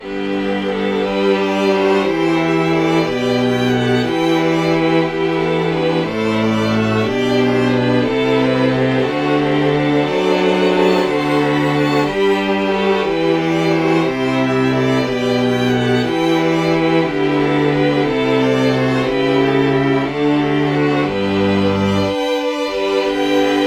violin

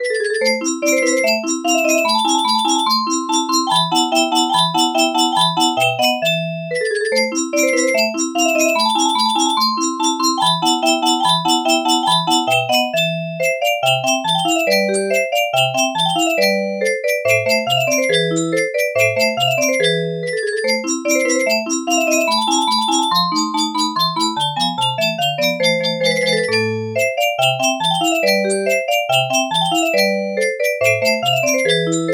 music box

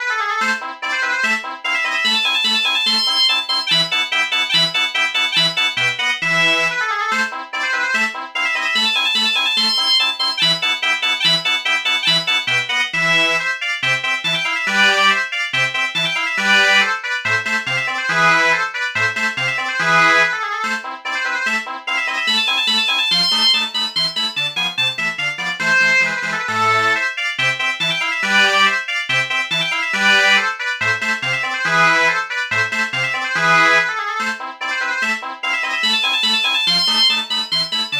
bagpipe

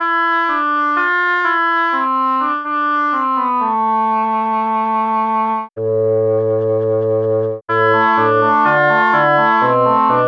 oboe

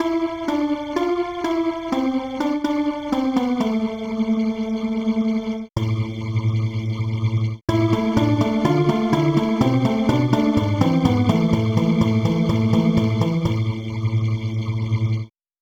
calliope lead

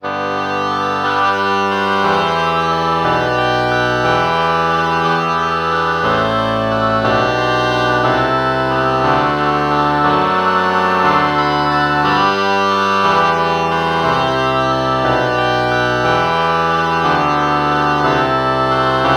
oboe oboe lpc false bach morph

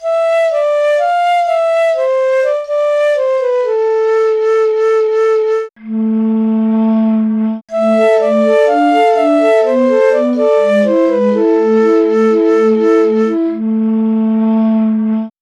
piccolo

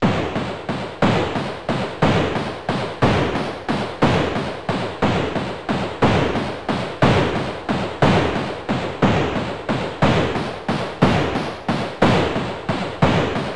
gun shot

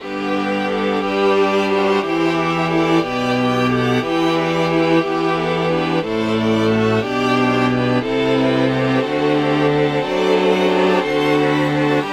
fiddle

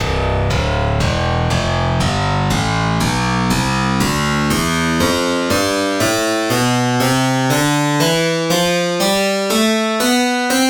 harpsichord tonleiter; SpectMorph Evaluation #4

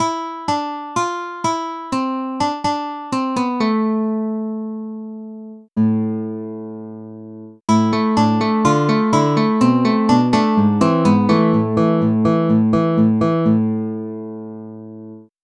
nylon string guitar

